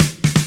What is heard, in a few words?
Fill Ins 128BPM; Vengeance Samples; VEH2 Loops